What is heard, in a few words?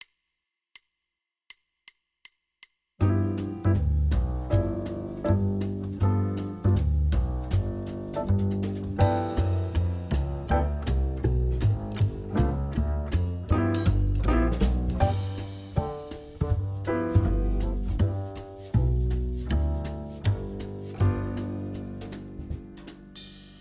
G mixo 160; voodoo horns